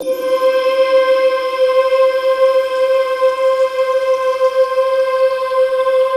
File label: C4, RAIN CHOIR; Best Service; Advanced Media Trax Modern Composer